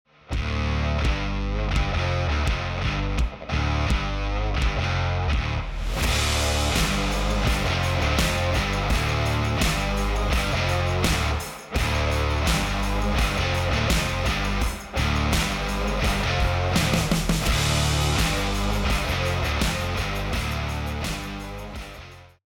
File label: Rock Mix Hardware